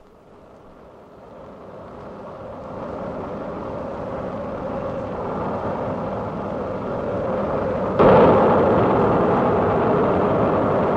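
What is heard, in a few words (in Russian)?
запуск ракеты V2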